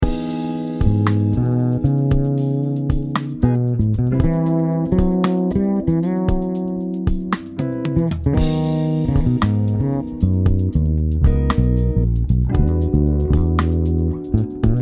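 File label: Fodera Emperor Fretless, bass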